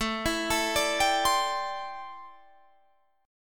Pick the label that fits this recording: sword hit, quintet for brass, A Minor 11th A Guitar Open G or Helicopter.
A Minor 11th A Guitar Open G